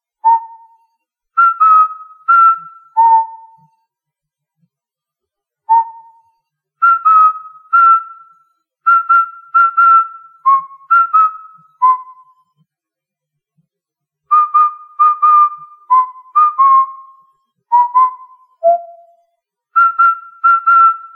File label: Whistle, Luigi's whistling